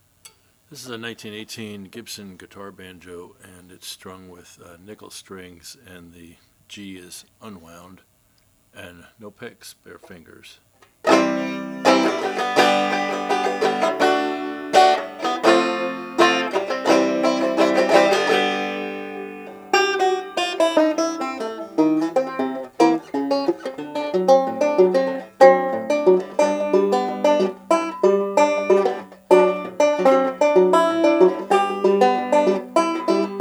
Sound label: gibson gb guitar banjo; 1918; sunburst